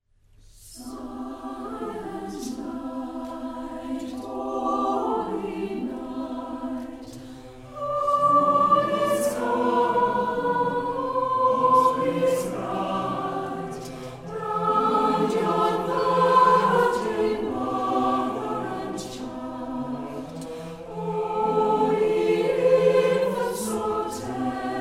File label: Silent Night Choir; Brisbane Concert Choir